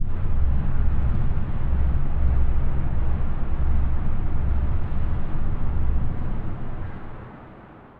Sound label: Trillion Quake, Pad; Instruments; Ableton; Drone Lab